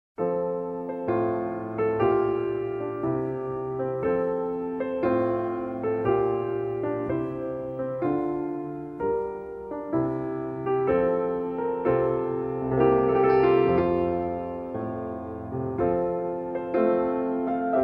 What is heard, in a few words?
piano